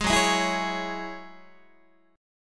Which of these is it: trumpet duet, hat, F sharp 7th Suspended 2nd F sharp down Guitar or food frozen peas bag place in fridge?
F sharp 7th Suspended 2nd F sharp down Guitar